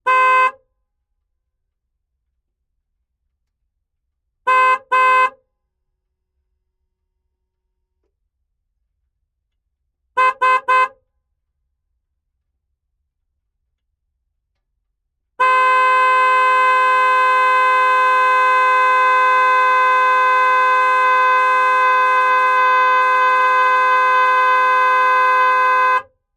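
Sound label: chevrolet silverado tow truck onboard horn various engine right; sonniss gdc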